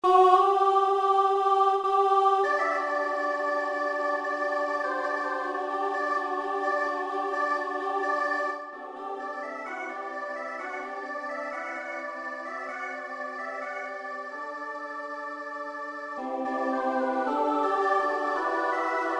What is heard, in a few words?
SATB with soprano solo